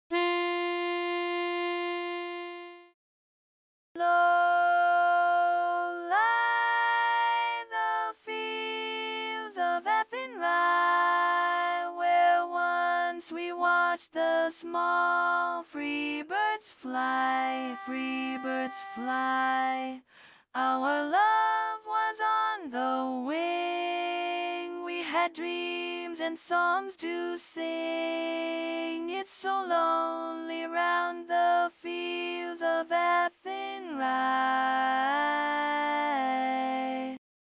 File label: Bass predominant; learning tracks